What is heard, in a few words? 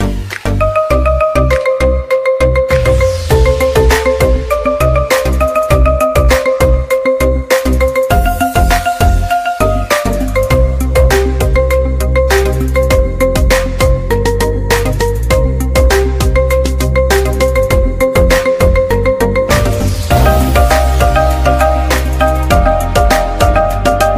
iPhone Ringtone